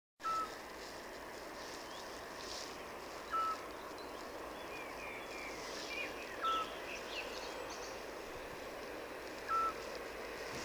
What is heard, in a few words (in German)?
Zwergohreule; DAK